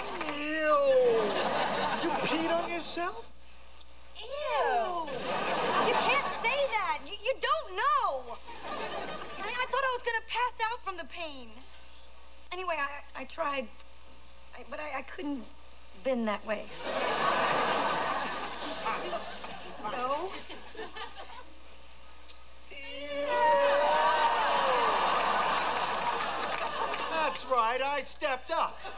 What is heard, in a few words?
ewwwww